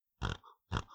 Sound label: pig idle